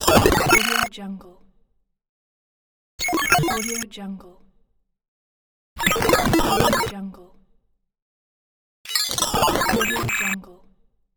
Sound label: glitch